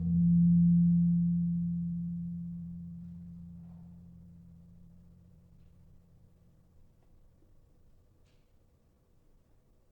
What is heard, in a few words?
Gong 3 F Sharp, gamelan tuned